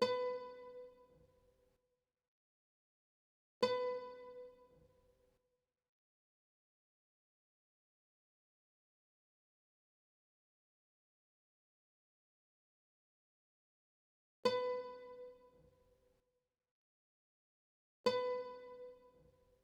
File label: 133 BPM G# Min Harp; World; Cymatics; Bazaar